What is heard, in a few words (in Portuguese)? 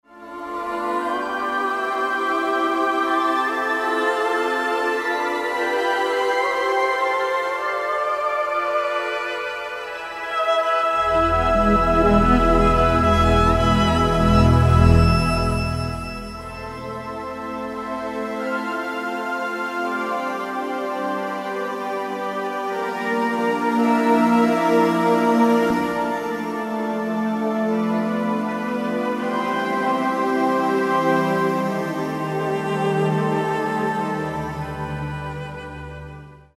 Santuario Aguas, CD destinado a meditação, relaxamento e práticas devocionais.; terapias de cura